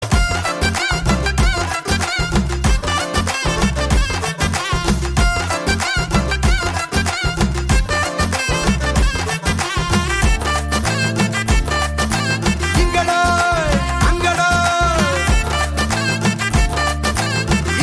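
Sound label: Love background music; Jai Bhim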